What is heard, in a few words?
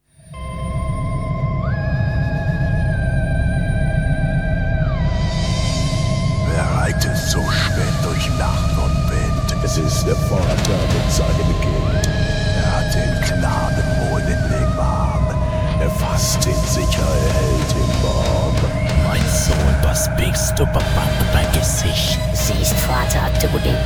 Death Metal